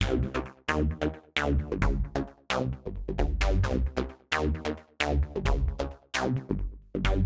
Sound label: 132 House Arp Cmaj; Dance DJ; Synth; Arps; Pro Tools Essential Loops; Digidesign